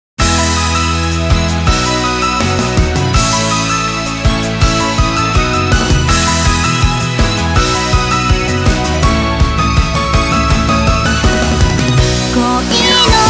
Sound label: melody